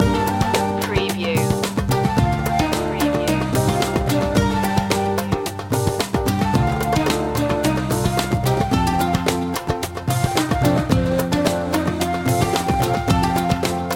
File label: persian night loop